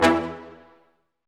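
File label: BRS Large Ensemble stac; Roland L-CD702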